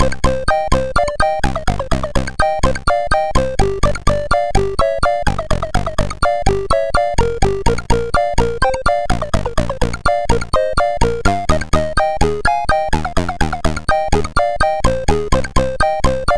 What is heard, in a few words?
sid MOS6581R2